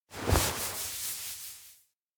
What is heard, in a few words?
head rub rough; awayuki; anemoi パッケージ版 1.1